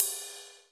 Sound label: RIDE, RIDE CYMBALS; Crypton - DrumBase5000